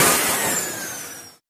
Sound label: jetgun off